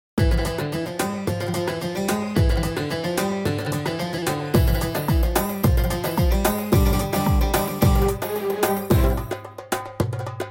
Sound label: beat